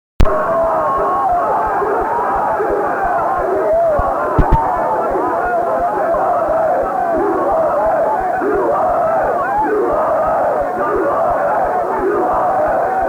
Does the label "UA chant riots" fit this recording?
Yes